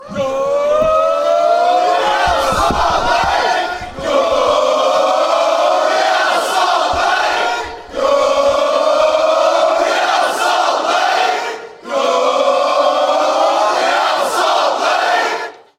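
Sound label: go real salt lake, football chant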